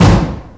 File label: Clank, Industrial; Sonic Foundry (Sony Creative Software)